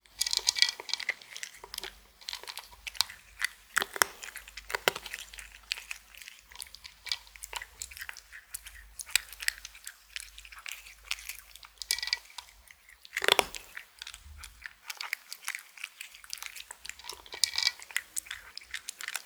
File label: Cat Eating Dry Food; SES Animals SFX